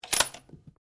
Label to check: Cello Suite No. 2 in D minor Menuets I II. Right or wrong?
Wrong